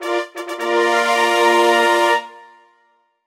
FANFARE (Earn Star); the princess bride

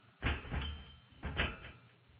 Animatronic Move

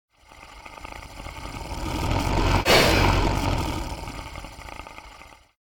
Raptor snore

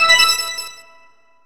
Silver Coin; Diddy Kong Racing; Nintendo 64

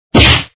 Good Punch; Dad 'n Me

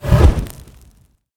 fireballs

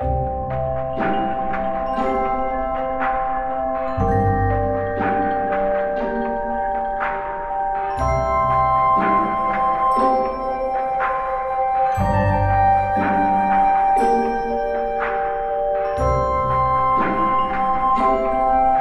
Buildup Xmas, gamerip

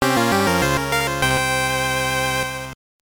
Jingle, soundtrack; Shougi Saikyou; SGB

